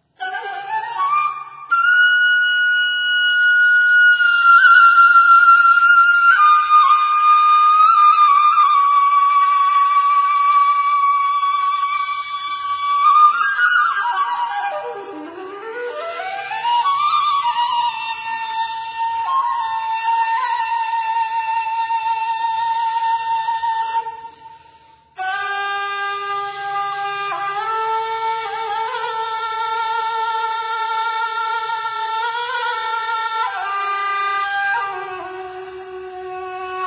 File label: Kaval solo